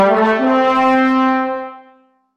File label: level up